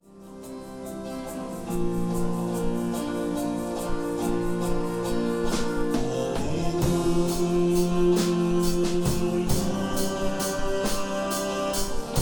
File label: Aleluia, banda; festa da padroeira 2019